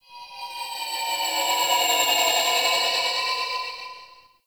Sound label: Ship High Washed Bells; Warframe; Corpus Ship Remaster